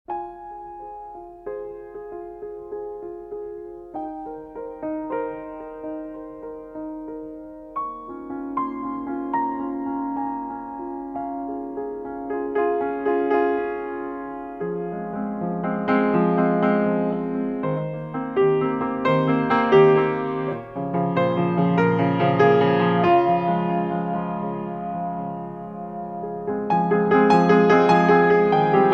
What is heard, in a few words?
Waltz